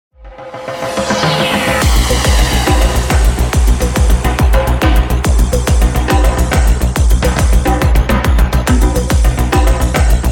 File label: conga fury